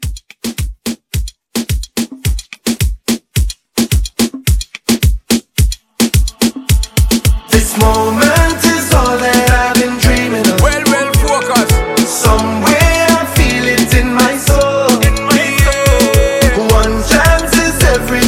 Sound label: moomba, 108